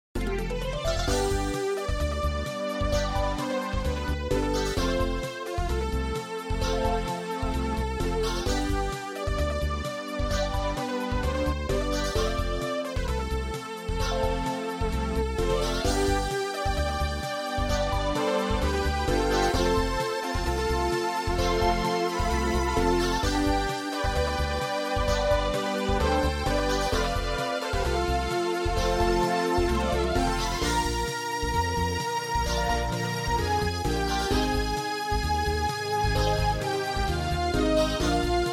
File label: MIDI Airship